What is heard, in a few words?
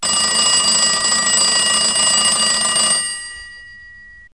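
ALARM CLOCK; Wired for Sound Pro CD; Aristosoft, Inc.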